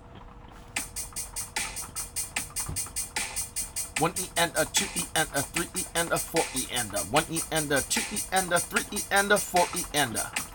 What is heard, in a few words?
sixteenth, drill rhythmic notation